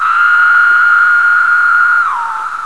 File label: cicadas